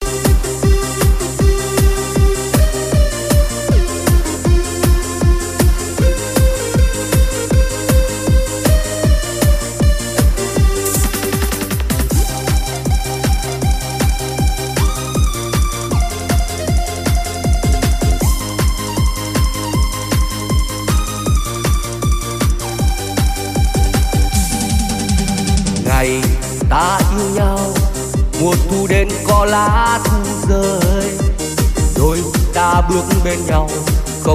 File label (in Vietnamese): Liên Khúc Nhạc Sống Remix Sôi Động Cực Bốc